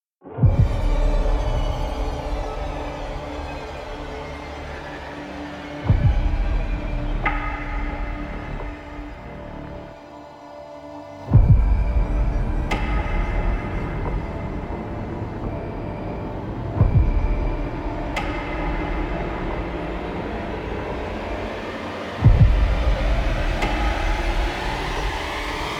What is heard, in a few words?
rise, striking strings glissandi; jasl audio